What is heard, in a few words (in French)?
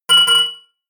Son Moche